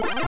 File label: ate energizer; Mrs PacMan